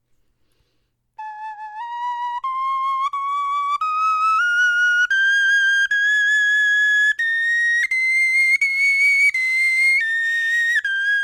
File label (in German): tonleiter